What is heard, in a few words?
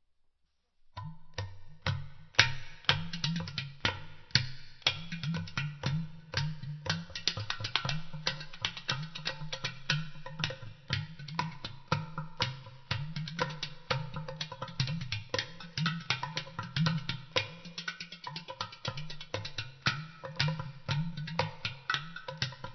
Udus